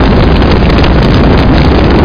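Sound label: flame breath; Hexen II; eidolon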